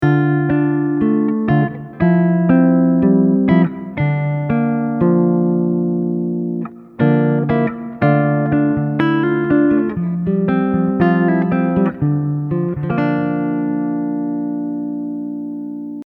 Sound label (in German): Unterschied Dur und Moll